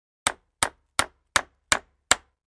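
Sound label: 2x4 Hammer Nail On Cement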